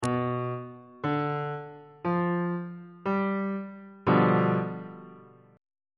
a double flat dominant 7th 1st inversion on bass clef